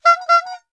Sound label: Old Bicycle, notifications; Huawei P30